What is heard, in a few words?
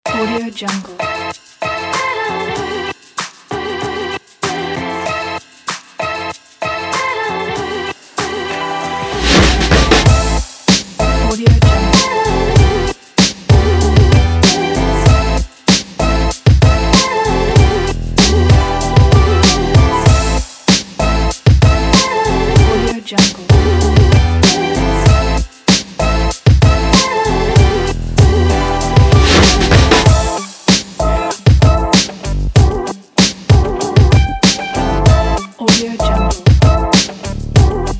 the hiphop